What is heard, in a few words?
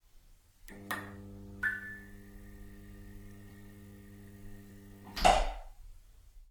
Neon Light Switch On